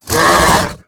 Twilight Drake Attack

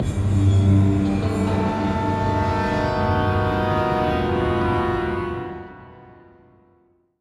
Stinger; Dr Morrigan

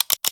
Blunderbuss Underlay

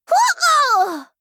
Death

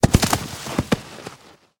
Four Hooves Death

